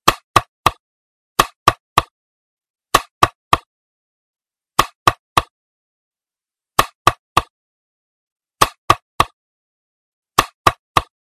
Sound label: knock tapping